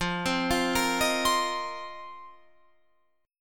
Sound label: F 7th Suspended 4th F down Guitar Open F; arpeggiated